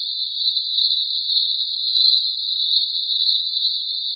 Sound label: night; svencoop; st mission